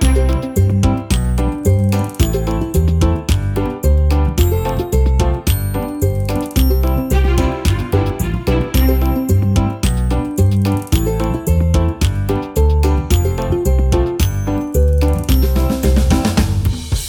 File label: music; Looney Tunes (New) - Match Up!